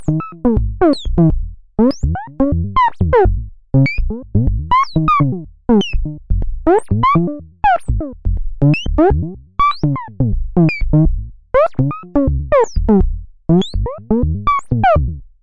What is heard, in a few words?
123 bleeping melodyman; SYNTH AND ELECTRONIC LOOPS